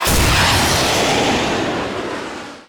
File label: rocket pack boosters fire; tf2